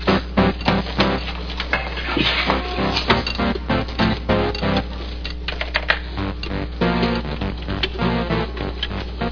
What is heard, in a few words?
cool tune